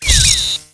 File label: station denied; Tribes2; powered